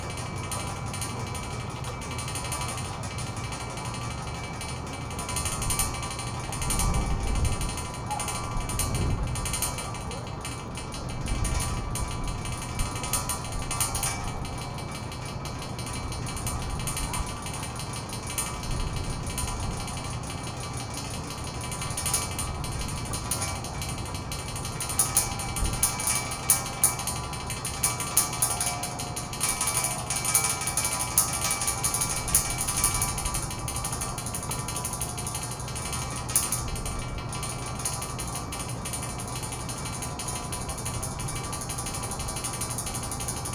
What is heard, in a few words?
vibrating ghost sign